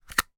technology camera digital battery lithium ion remove from slot